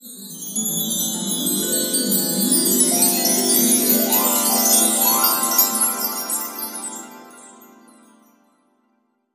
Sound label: Rise